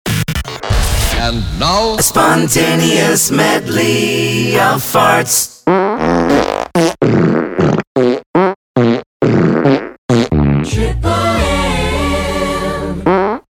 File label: Spontaneous Medley Of Farts